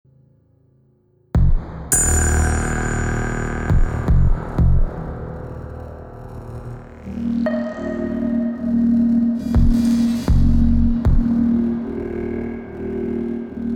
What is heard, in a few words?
ASMR Machine fragment space